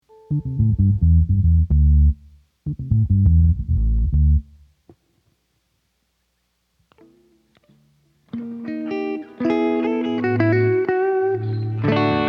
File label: Strings Emphasis